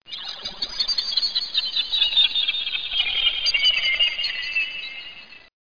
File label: Space Ship Landing; Let's Discover Michigan!